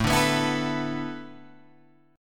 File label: A Suspended 2nd Suspended 4th A Guitar Open G, chord